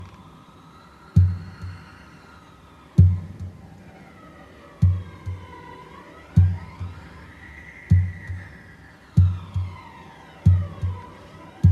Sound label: smooth